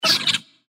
mantis chase vox; npc

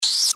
giant rat injured; npc

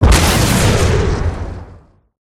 rocket explosion; gow; silverback

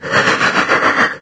breath; doom3; archvile

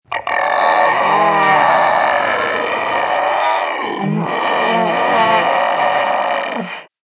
alert, zomb female; nmr

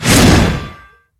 swing metal

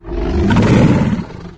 chatter; cyberdemon

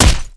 hit

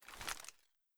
rattle, weapons fal; cw20 extras

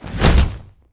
swing, rebar club; weapons; props dane

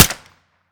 shoot, weapons; cw20 extras

